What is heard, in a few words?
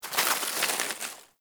Exterior Forest Critter; Duviri